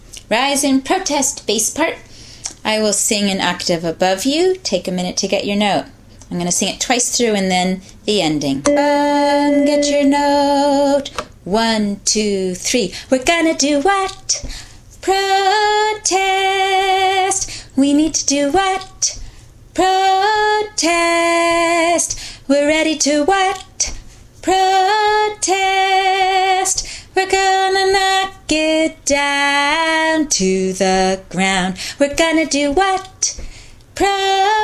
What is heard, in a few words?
bass